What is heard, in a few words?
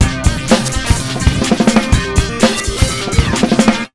beat, Electro 125, Big Beat Drumloops; USB Soundscan vol.25; AKAI